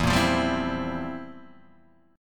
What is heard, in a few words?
F Major 7th Suspended 2nd Suspended 4th, E, guitar